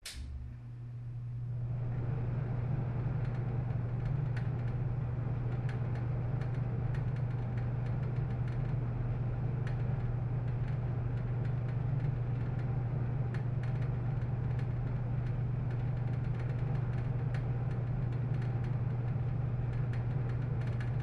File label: Air Conditioner Vent 3; On With Switch Click, Run with Rattles, Switch Click Off